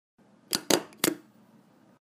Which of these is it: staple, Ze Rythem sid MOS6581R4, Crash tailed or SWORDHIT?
staple